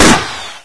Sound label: m41a, weapons; svencoop; st mission